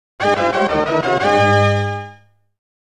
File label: Victory; nobunaga no yabou haouden; daikoukai jidai ii